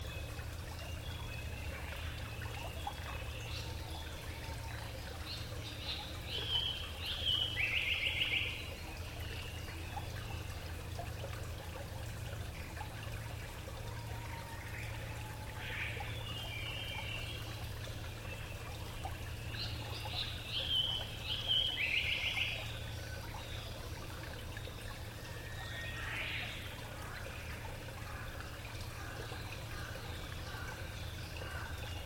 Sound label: black paradise flycatcher, bird